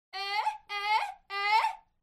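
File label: MEXFEMALE Vocal; Spectrasonics Virtual Instruments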